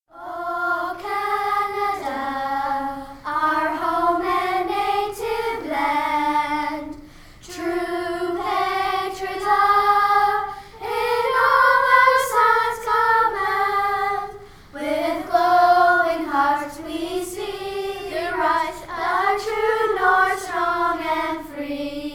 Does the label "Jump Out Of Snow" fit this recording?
No